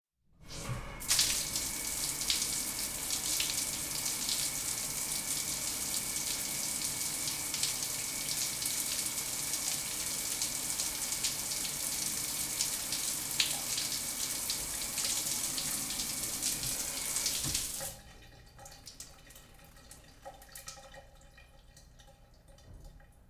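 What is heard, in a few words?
small shower sprinkler sound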